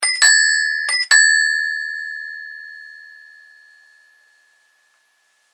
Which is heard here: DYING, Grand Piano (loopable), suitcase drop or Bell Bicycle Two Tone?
Bell Bicycle Two Tone